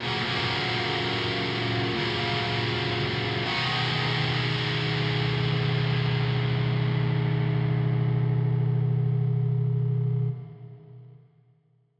GUITAR AUTOMATION OFF; KONTAKT LIBRARY

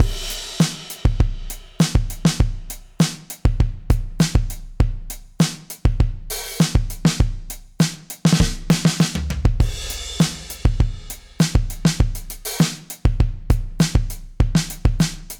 FUNK GROOVE DRUMS FULL VELOCITY OFF

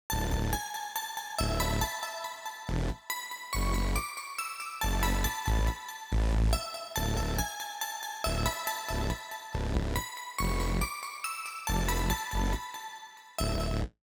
bass original cord

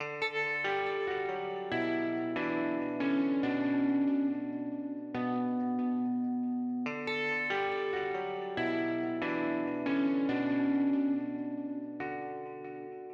INTRO GUITAR; KONTAKT LIBRARY

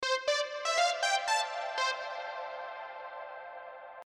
SIDECHAIN REVERB DRY

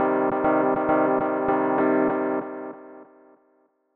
Delay

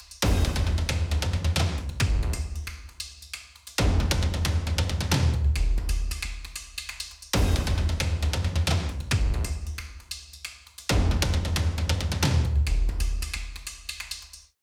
REVERB PERCUSSION REVERB OFF

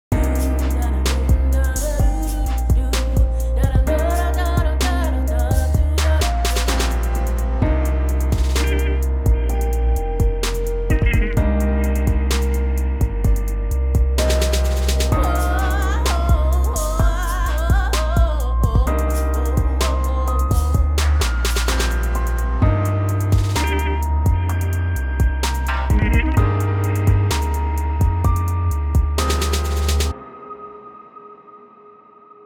song reverb on

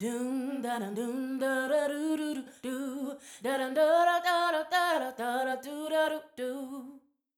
REVERB ON VOCAL ONLY

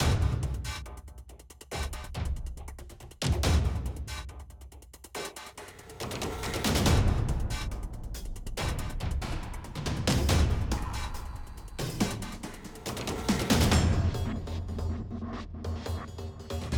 SONG DAMAGE EFFECT OFF; KONTAKT LIBRARY

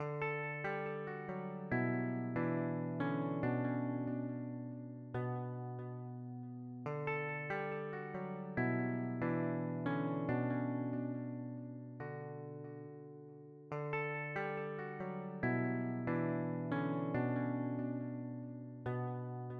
KONTAKT LIBRARY INTRO GUITAR PART OFF

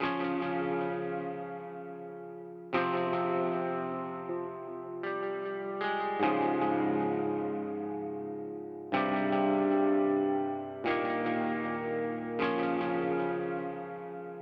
LOUD ROCK LOUD GUITAR; SIGMA OFF

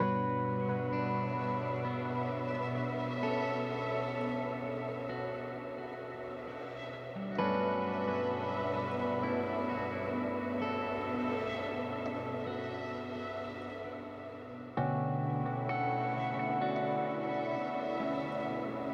Kontakt third party strings mid; demo song opacity

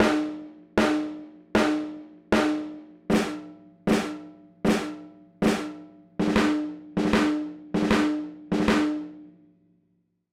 GARAGE ROCK DRUMS SNARE 3 TYPES

